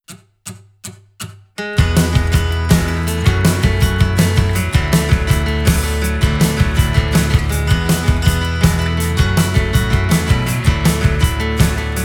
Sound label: (Acoustic)